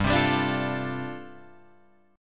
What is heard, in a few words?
G 7th Suspended 2nd Suspended 4th, Guitar Open G